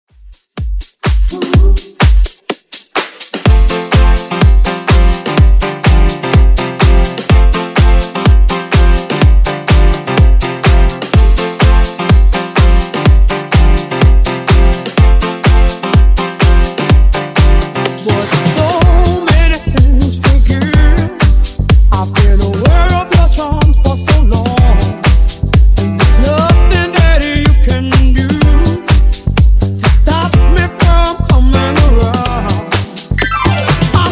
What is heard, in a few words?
Nudisko House Redrum 125 Bpm